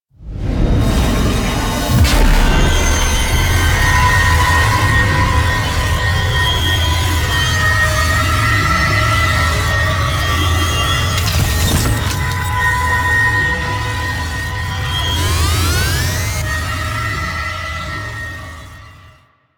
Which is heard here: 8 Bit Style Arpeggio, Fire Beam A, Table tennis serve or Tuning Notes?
Fire Beam A